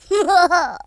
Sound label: laugh, Gangsta; speech